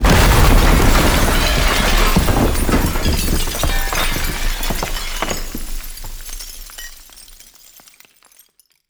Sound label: smash; skibidi toilet emperor; explovill